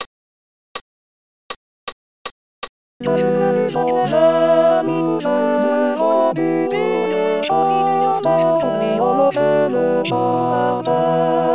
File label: Tourdion Alto; chorale adultes